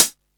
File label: muted pedal hi hat one shot g sharp key